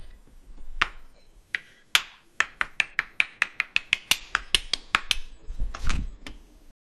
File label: chaching chaching winning, casino